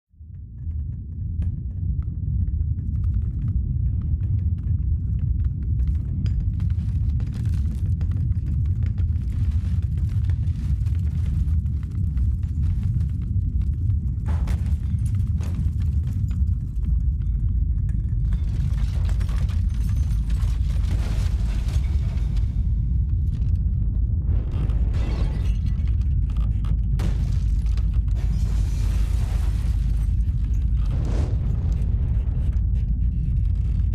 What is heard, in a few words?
Quake SFX, earthquakes